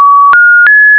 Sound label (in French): do mi sol echantillone a 8 khz